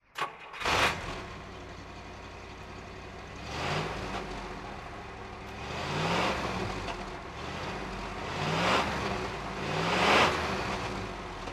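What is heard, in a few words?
bentley s3 continental throttle; Bentley S3 Continental, 1963; Engine sound; V3D/ ClassicarImages; Marc Vorgers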